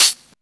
dark high hat sample C# key, Royality free foot hi hat sound tuned to the C# note; hat samples; C sharp